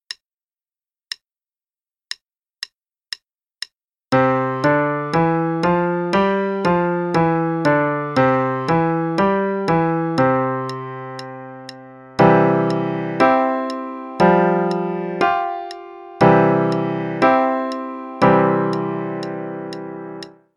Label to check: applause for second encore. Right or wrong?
Wrong